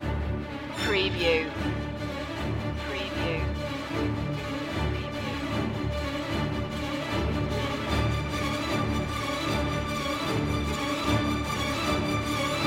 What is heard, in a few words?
strings only